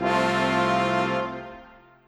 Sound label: Brass; Sonic Foundry (Sony Creative Software); Orchestral VOL-2; Modern 31